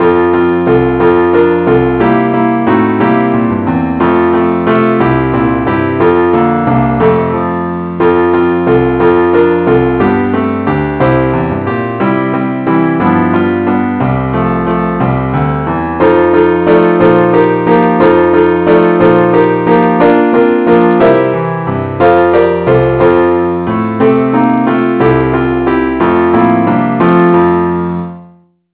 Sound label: angel voices keyboard only